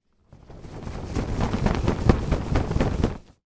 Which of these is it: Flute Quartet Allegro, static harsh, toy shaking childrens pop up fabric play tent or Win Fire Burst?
toy shaking childrens pop up fabric play tent